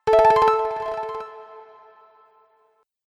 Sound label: Games Win Sound, video game